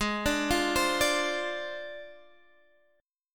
F Minor 6th, G sharp

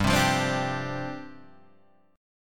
G 7th Suspended 4th G down Guitar Half Step Down

